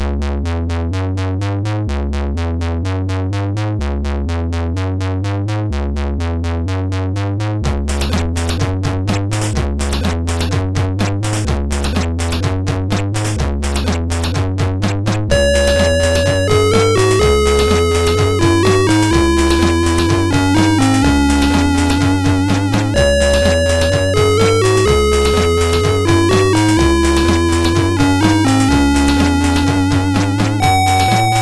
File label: tune, MOS6581R4